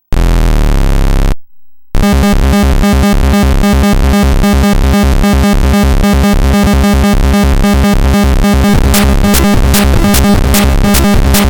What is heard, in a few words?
bass, synthcart; PAUL SLOCUM